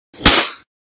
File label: pop